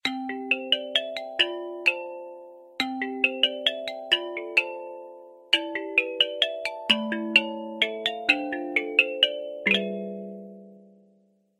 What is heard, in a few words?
lullaby samsung galaxy s9 ringtone